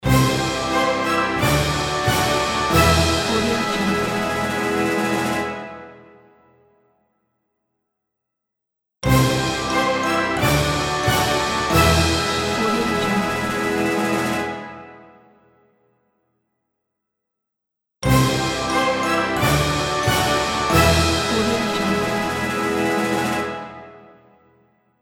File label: winner fanfare; andreimodernmusic